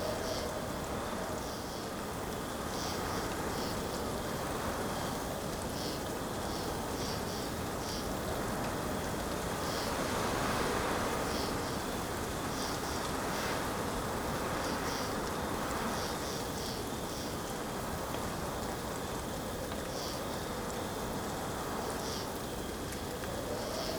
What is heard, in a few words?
ambience coniferous forest night windy drizzle; Rust